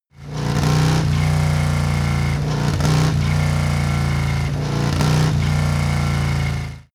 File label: motorcycle harley engine rev triple